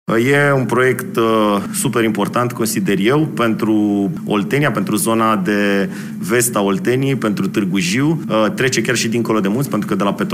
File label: drum